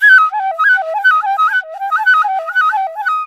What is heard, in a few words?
INDIA PICC